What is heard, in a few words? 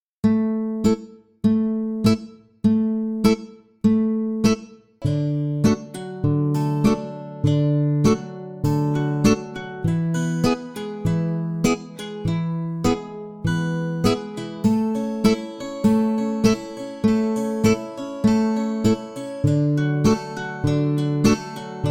Guitar Nylon Bass n Pick